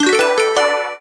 super meter joker win; mega joker

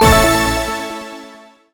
win small drop; dazzle

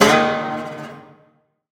scatter Landed; dead or alive 2

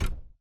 reel bounze; cashnoire

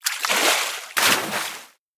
koi animation disappears Win Fish; koi princess

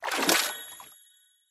three kois stick their heads above the surface; koi princess

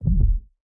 explode pre animation; serengeti kings

